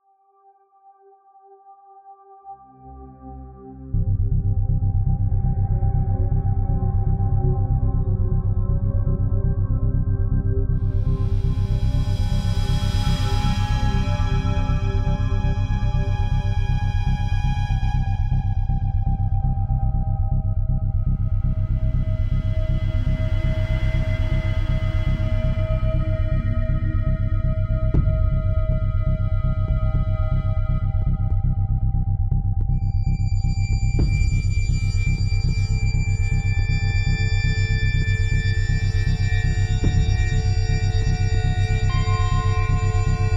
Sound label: SUSPENSE THRILLER; Music Cues Sci Fi / Action